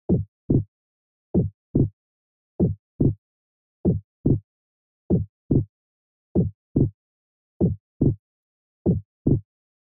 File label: Heartbeat Slow Loop